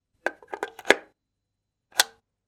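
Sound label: socket making sounds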